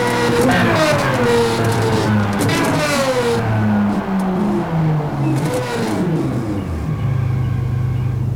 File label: throttle off, vehicles; sgmcars; zondac